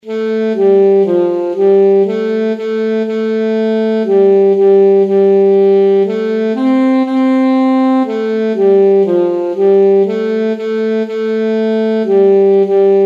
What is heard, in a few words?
merrily we roll along alto saxophone